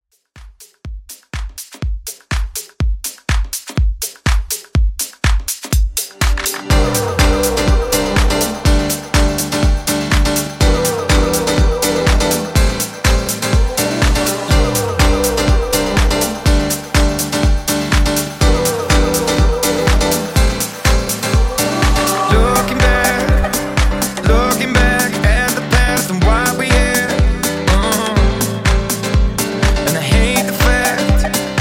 Top40 Club ReDrum 123Bpm

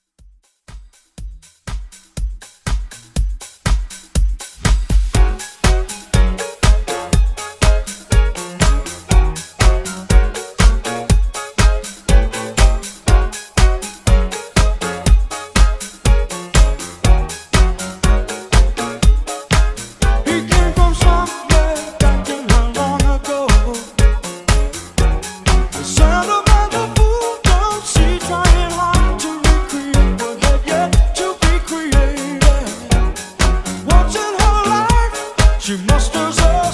70s Redrum

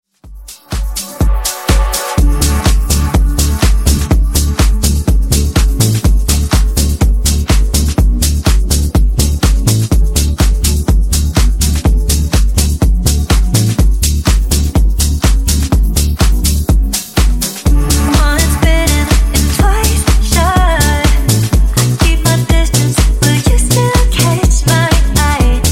Disko Funk 124Bpm

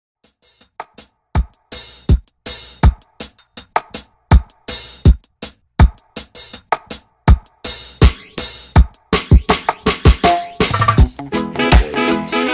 90s Reggae, 81Bpm

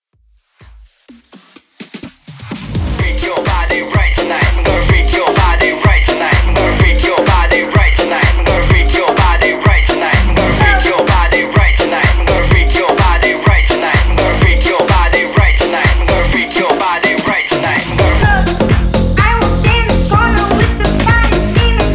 Bass House

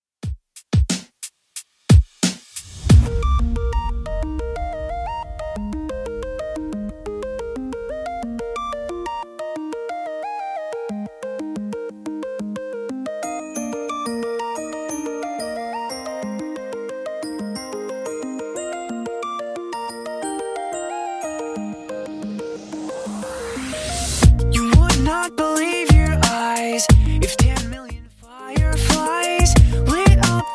2ks Redrum 90bpm

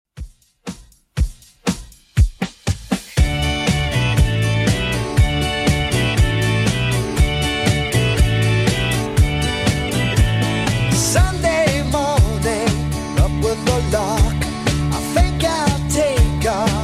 70s Redrum 120bpm

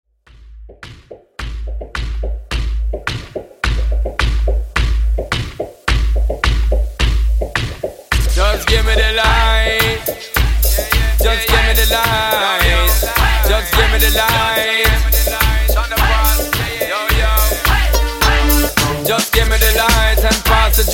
Bass Dance Hall, 107 Bpm

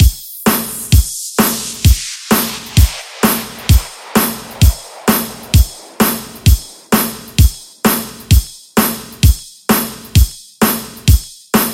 90s Upbeat

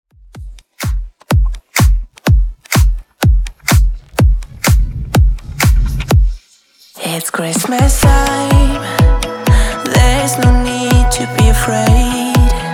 Christmas Re drum

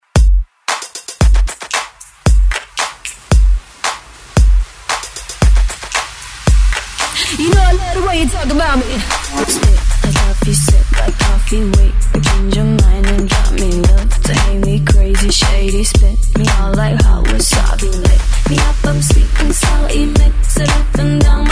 ReDrum 114 BPM

